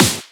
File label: Snares; Vengeance - Essential Clubsounds Vol.4